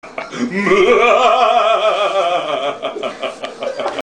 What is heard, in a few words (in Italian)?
risata